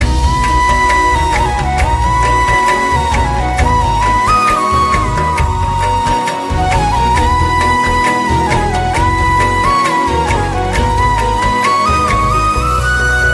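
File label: background music Telugu Ringtone; Love Story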